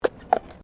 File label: Tram Horse Drawn Horse Step Gravel; Horse drawn tramcar; Carriages (Sound Effects:Vehicles)